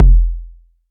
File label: Short Kick F; 808 Day